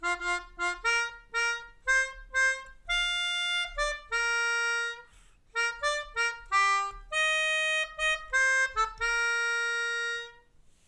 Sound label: Melodica